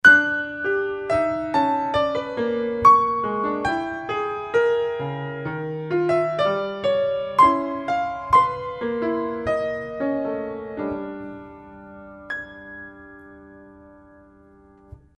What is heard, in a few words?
Swing